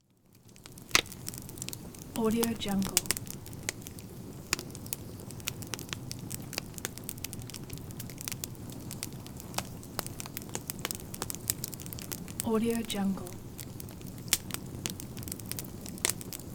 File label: fire crackling